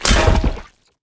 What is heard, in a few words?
zombie bodyfall; smite; ahpuch skin10